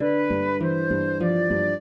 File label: trio, flute harp; Mozart